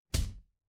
retro game hit

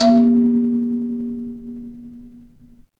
instrument kalimba

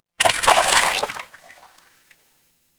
water splash

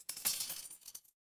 glass shatter

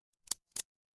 glass crack

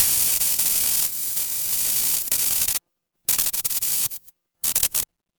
fire electric spark

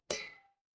glass clink

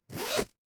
paper fabric zipper

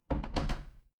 door creak

